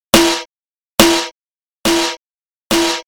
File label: limit